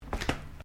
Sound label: FOOTSTEPS THONGS (FLIPFLOPS) TILES STEP; Footstep Foley; Human